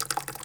Water Drops, household rhythm samples hits

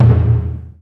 LARGE DRUM, JAPANESE PRC

